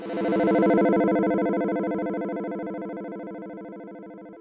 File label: wave warpz